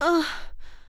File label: Female Tired Breath